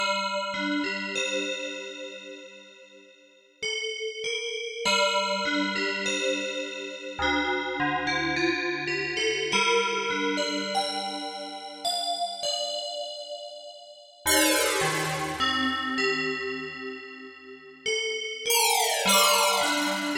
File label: Spektrum Bell, Korg Radias-R